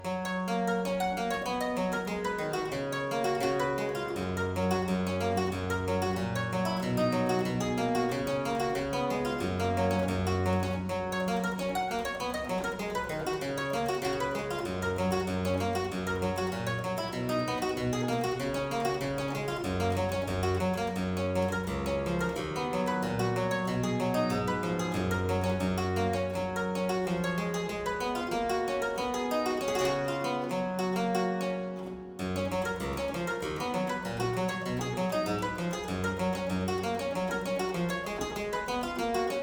Aria in G major Partita 23, Harpsichord; Buxtehude; Complete Harpsichord Music; Aria 'La Capricciosa'; BuxWV250

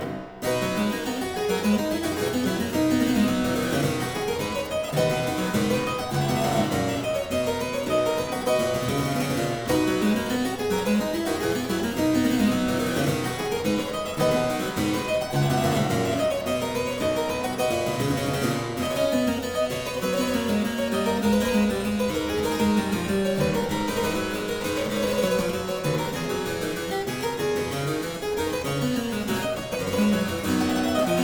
Aria Variations in C Major, Harpsichord

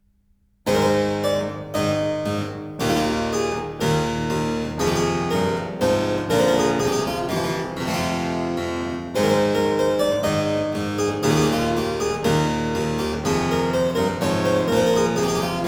Alman (harpsichord); Byrd

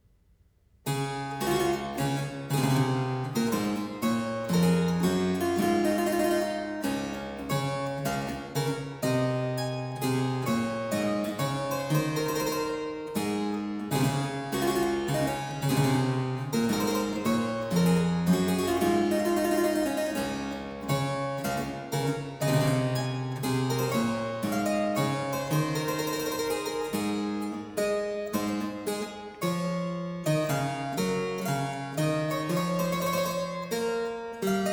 Saraband, harpsichord; Borry in D-sol-re#, ZD 219 No. 2; Complete Chamber Music; Brilliant Classics; Miscellaneous pieces for the harpsichord

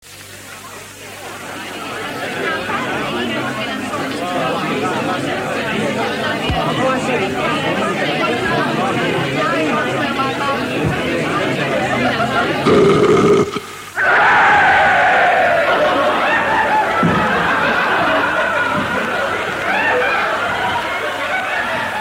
Intro Burp

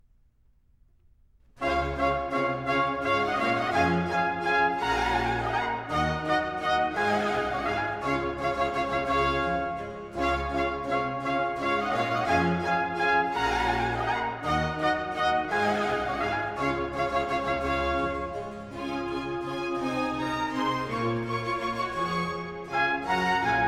Water Music Suite - Sarabande; Handel; Water Music Suite No. 2

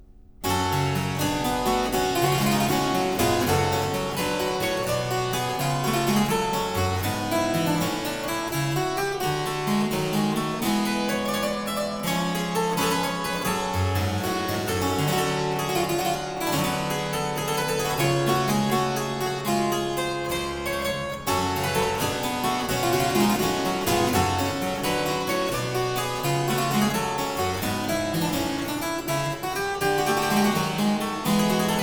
Suite in G Minor IV. Gigue, Harpsichord

